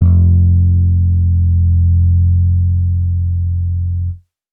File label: Dusty Analog Bass F, Bass Guitar; 99Sounds; Monosounds; Music Loops; Instrument Oneshots